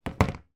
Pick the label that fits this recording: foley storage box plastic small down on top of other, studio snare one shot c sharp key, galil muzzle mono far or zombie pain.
foley storage box plastic small down on top of other